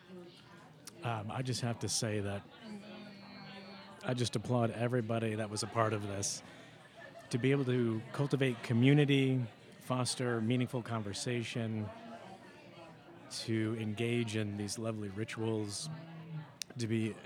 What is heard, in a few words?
Audience Recording; a-meal-premiere